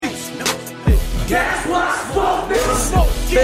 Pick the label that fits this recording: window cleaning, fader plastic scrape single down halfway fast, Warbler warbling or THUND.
window cleaning